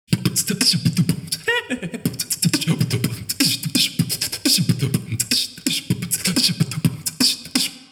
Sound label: beatbox 16 beats; guard1 mine